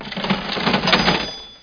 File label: new Bonus; F.A.R.M. Patrol